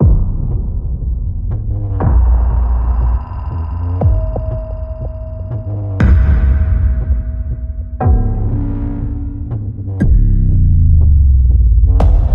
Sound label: Deep Impact; Noiiz